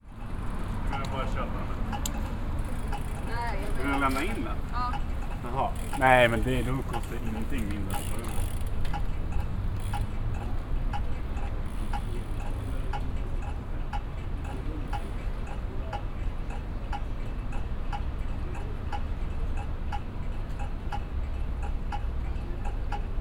traffic lights, stockholm

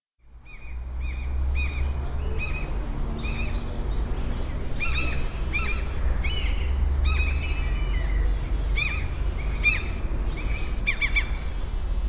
wild rose, abramtsevo

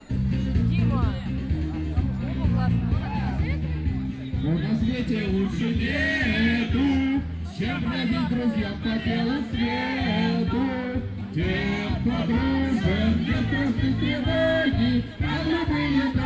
yeah yeah; sergiyevposad

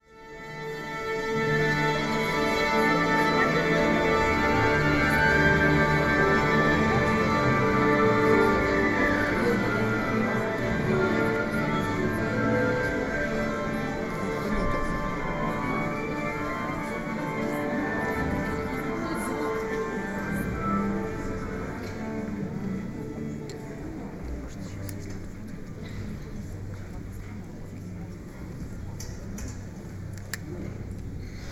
musicians warming up; sergiyev posad

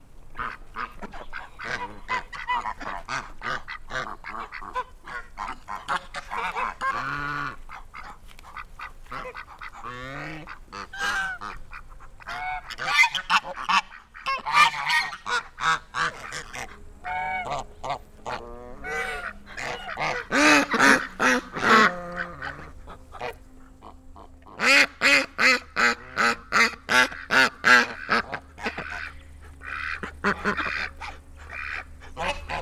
geese

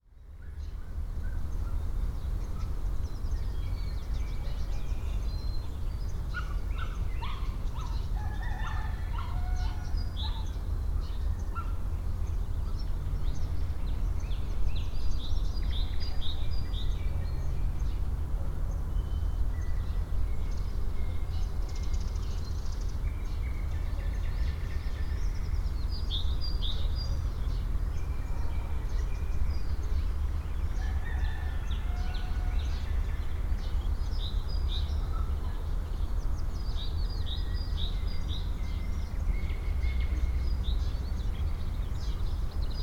soundwalk, sergiyevposad

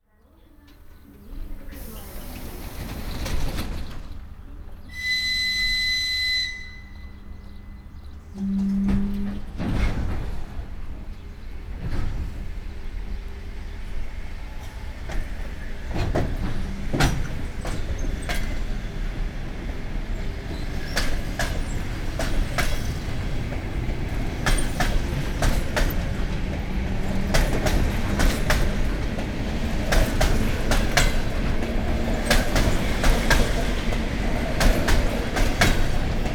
train gathering speed; sergiyevposad

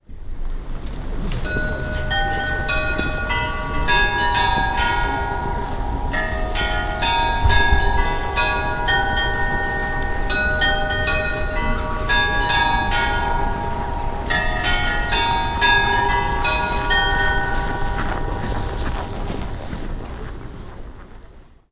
chimes; sergiyevposad

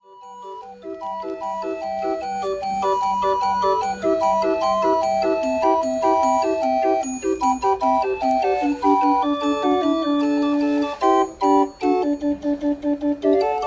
jukebox, Sheffield; kelham island

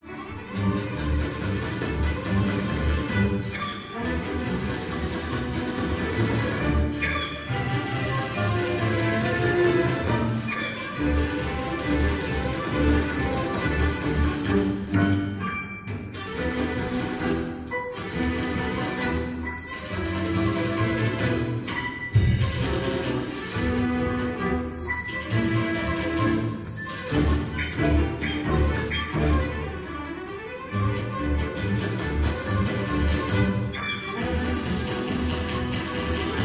the typewriter, municipal orchestra; sergiyev posad

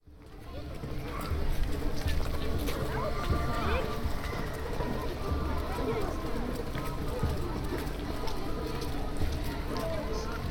krasnoyarmii avenue, sergiyev posad

